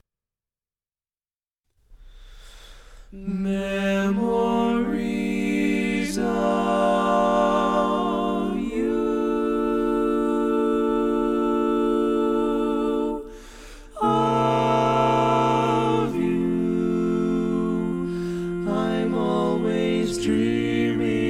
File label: Tenor, barbershop tags